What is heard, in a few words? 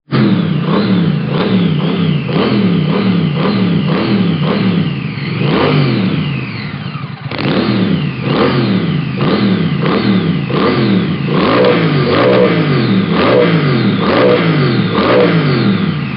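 Triumph Thruxton R Vance and Hines, motorcycle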